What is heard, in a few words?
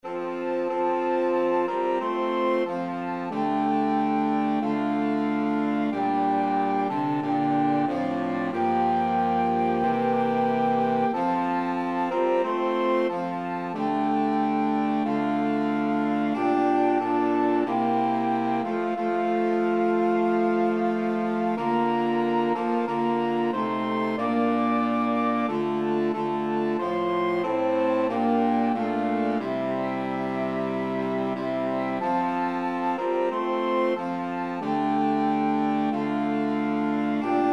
bleak midwinter, flexible mixed ensemble 3 players